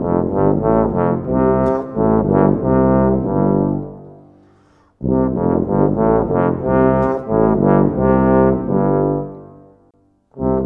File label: Rock-Pop Horns & Tuba; Sonic Foundry (Sony Creative Software) - Crimson Blue and Fabulous Horncraft 4 RnB; Orchestral4